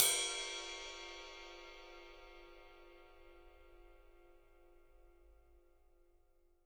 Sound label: PW RIDE, RIDE CYMBAL, 3D Drums; 3D Sound